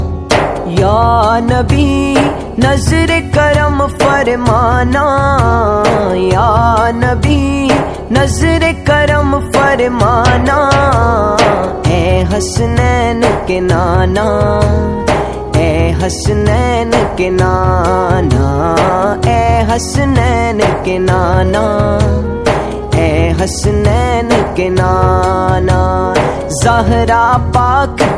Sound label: naat